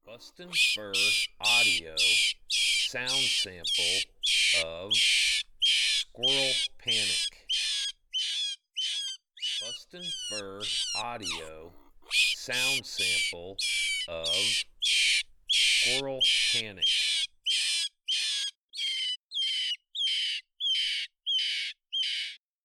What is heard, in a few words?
Squirrel Panic